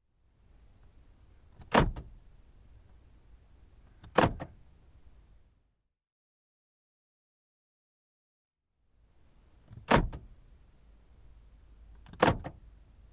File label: Lock Unlock